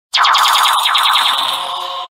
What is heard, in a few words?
Magical; برای تیزر